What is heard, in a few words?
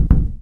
drywall footstep, plaster; physics